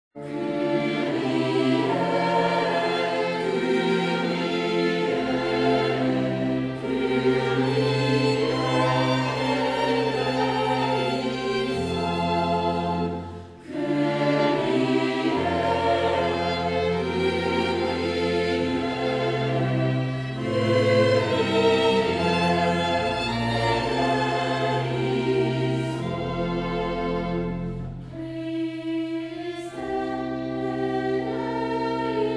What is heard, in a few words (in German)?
Messe Kyrie